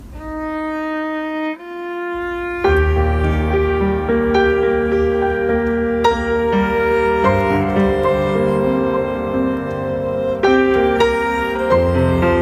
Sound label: Acoustic version